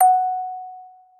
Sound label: musicbox